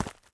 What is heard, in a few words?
FOOTSTEP Trainers Gravel Compact Run